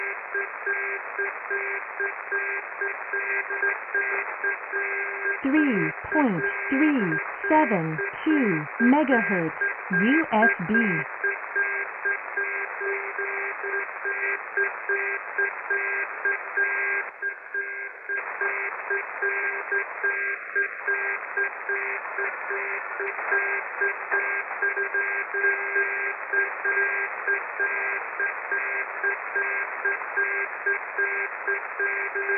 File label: usb, audio transmission; 3-372mhz; Can someone identify this audio transmission